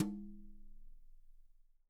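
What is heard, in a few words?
Yamaha 8X8, brush, drums; Big Fish Audio; Ross Garfield Drums VOL-2; T-YAM BRUSH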